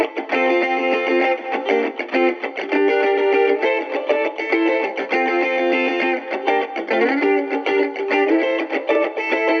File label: Guitars With Glue